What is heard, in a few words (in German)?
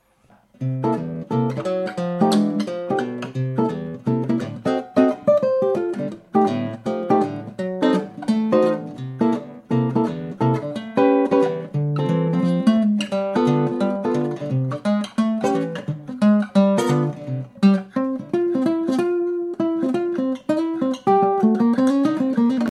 Groove Jazz Wohnzimmeraufnahme mit DAT Rekorder ohne Soundeffekte ohne Klangbearbeitun, guitars